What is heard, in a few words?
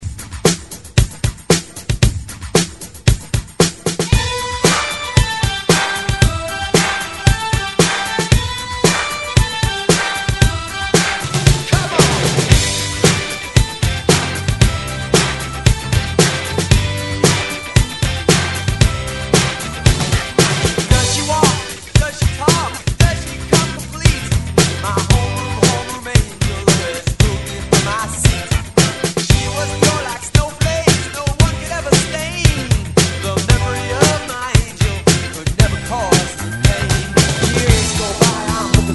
ReDrum, 114 Bpm; DJDOLLS